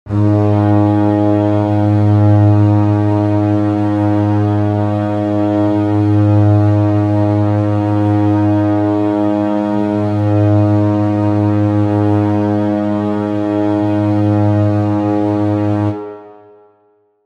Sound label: ab tuning drone